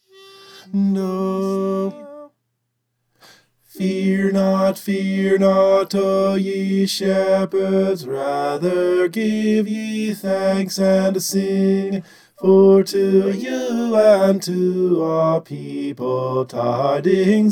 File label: bass